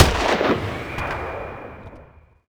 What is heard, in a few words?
imperial sniper mid